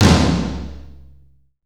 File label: Kit Heavy TOM3; CMI